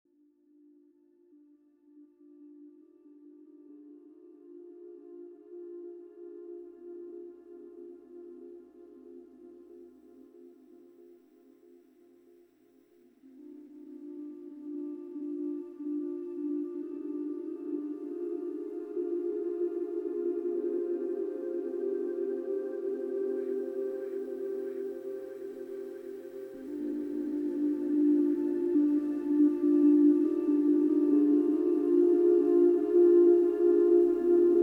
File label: music for whales